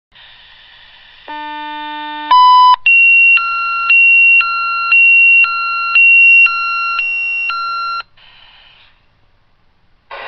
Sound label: Tone U, Watchdog Alert; OSI